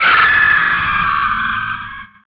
die; RYL 1 v5.57; nagasul

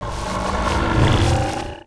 soram idle

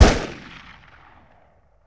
Pistol Shot With Echo; Weapons & Firearms; Sony Pictures Sound Effects Series

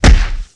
Mega Face Punch, hand to hand combat; Sony Pictures Sound Effects Series

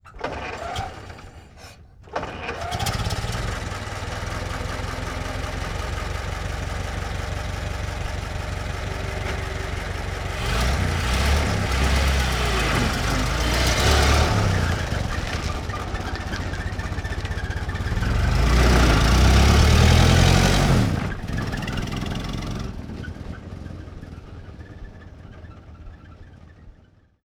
Ford Stake Bed Truck Ext Start Idle Away; Sony Pictures Sound Effects Series; Period Vehicles

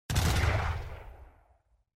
Muskets; Age of Empires III; cinematics

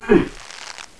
balram attack

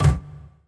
bow staff; RYL

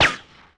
Rico & Whiz By Single; Sony Pictures Sound Effects Series; Ricochets - Hits - Whiz Bys

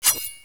Ghost Attack

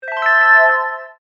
Smooth message; Sony Ericsson P1i

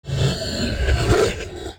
Wizard

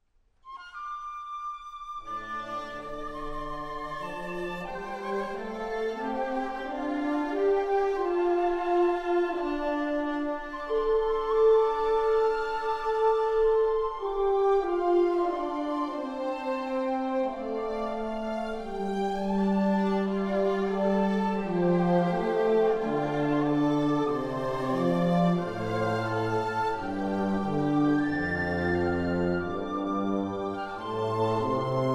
Music; Age of Empires III; cinematics

